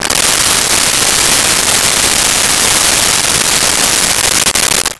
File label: Jig Saw; 1001 Sound Effects